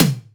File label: Toms, Real